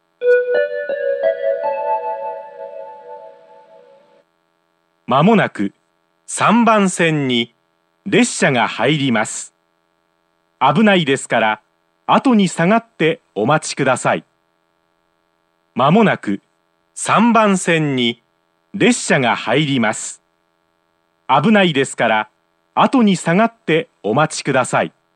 yumoto 1 bannsenn sekkinn

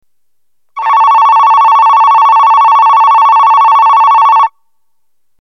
yanaba skiing ground; 発車ベル

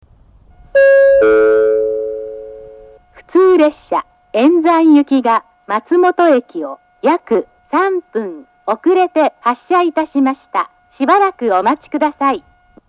murai 1 bannsenn matsumoto hassha

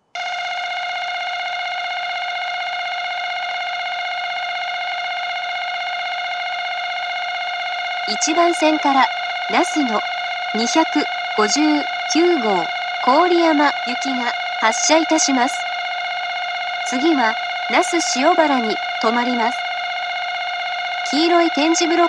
utsunomiya 1 bannsenn

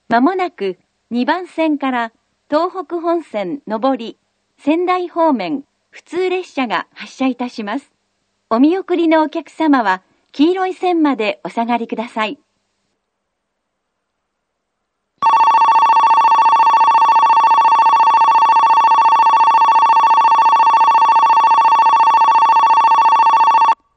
kogota 3 bannsenn

